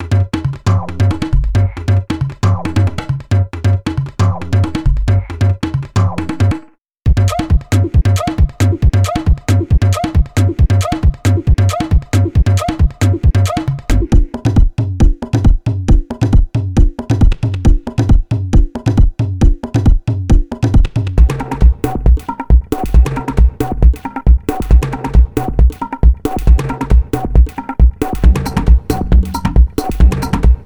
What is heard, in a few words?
Afro Tribal Drum Loops; Bluezone